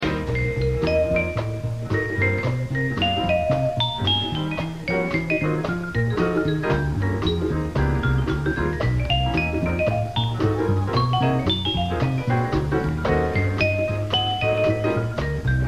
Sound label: Xylophone